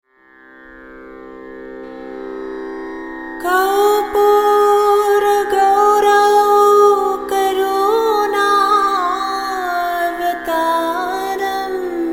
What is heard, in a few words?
Raga; Left Heart Chakra; Meditation Music